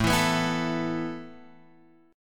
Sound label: A Suspended 2nd, down, Guitar, Open F; Asus2; strummed; JGuitar